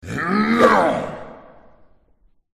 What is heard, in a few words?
attack voice up; vershitel